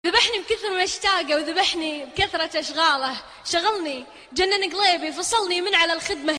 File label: noha ringtone